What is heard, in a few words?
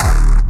hardstyle phase